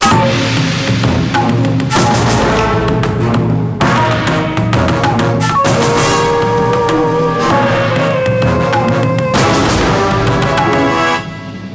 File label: Continue; Samurai Shodown VI; Atomiswave arcade gamerip 2005